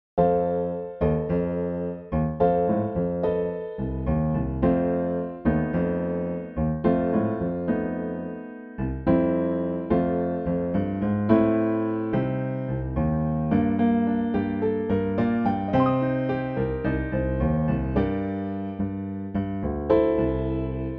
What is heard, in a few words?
acc only